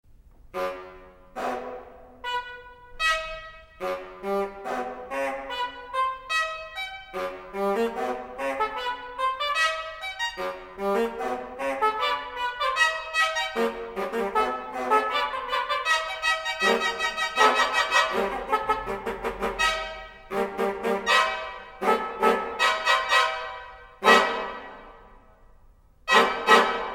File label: car horn prelude; ligeti györgy le grand macabre